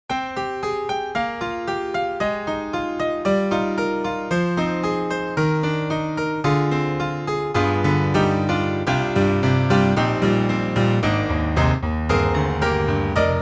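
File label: Ambient Grand, piano; IK Multimedia; Sonik Synth 2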